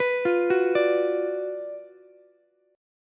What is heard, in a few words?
F sharp Major 7th Suspended 4th Sharp 5th, F sharp, down, Ukulele; F#M7sus4#5 arpeggiated